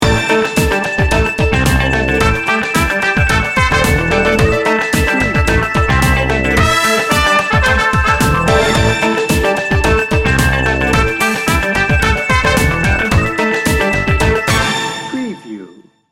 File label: funky street